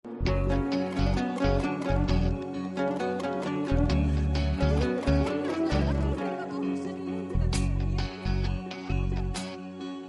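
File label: romantic background music Hindi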